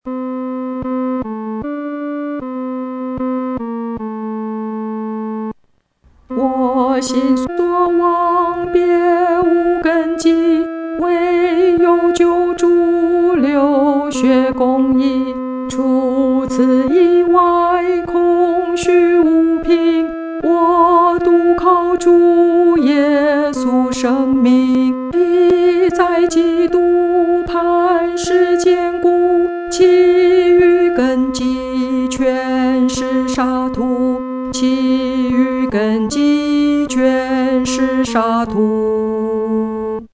独唱 第二声; 坚固磐石

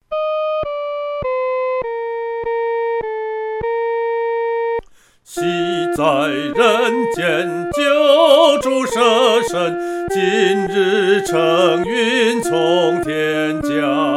独唱（第一声）

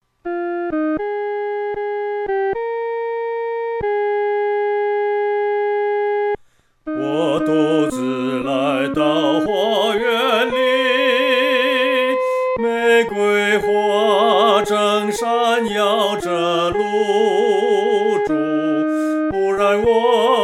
独唱 第一声; 在花园里

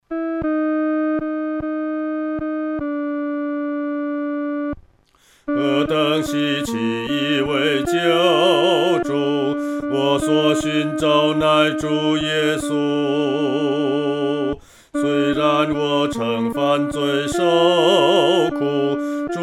独唱 第二声

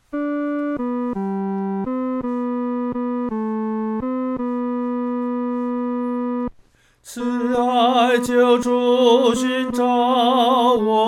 独唱 第三声

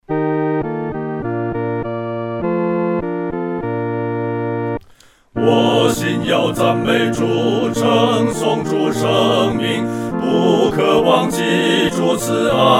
合唱 四声部; 赞美主恩

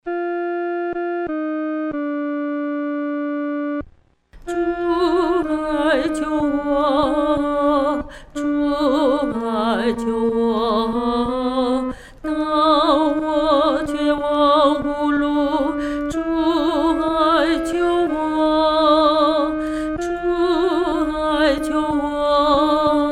独唱 第二声

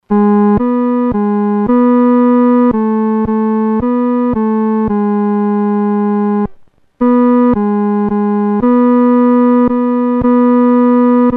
独奏 第三声; 奇妙圣经

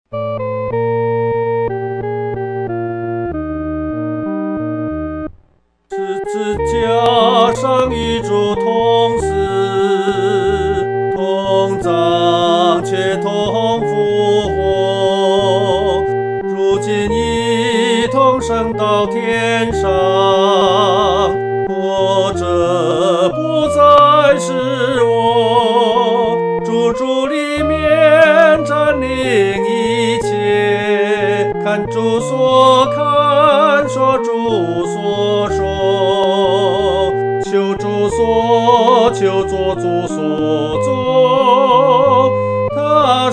独唱 男第一声

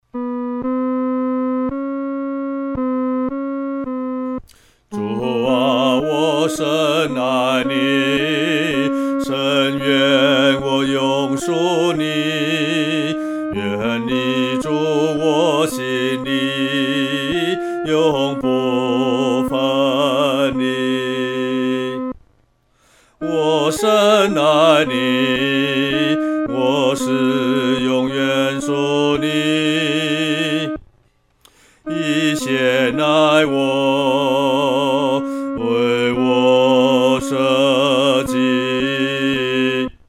独唱 第二声; 主啊！我深爱袮

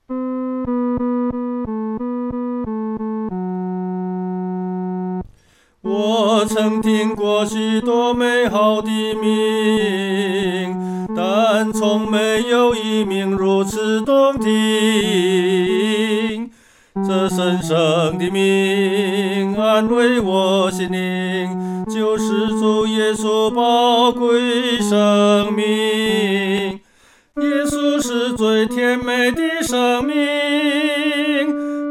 独唱 第三声

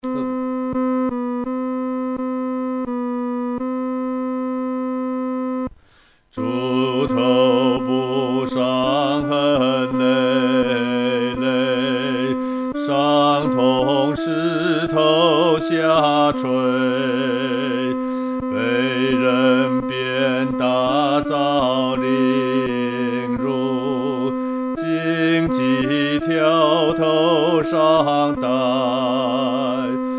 独唱 第二声; 至圣之首受创伤

